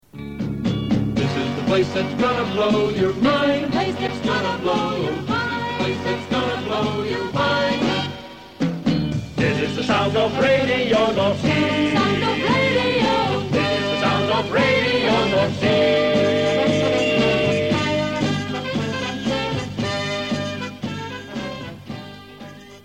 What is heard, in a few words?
jingles